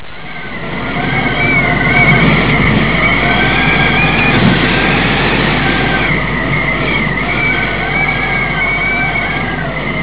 wind

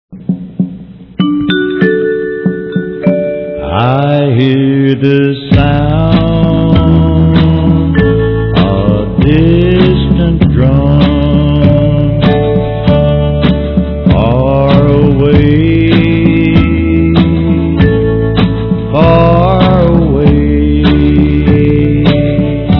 Distant Drums

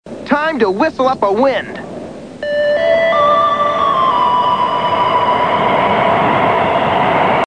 wind

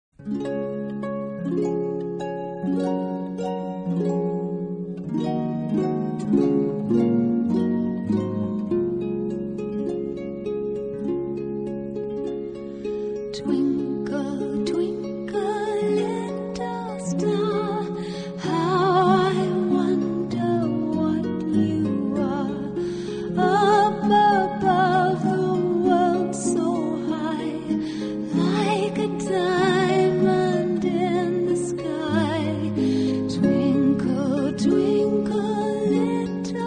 twinkle

